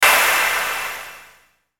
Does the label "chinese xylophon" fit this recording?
No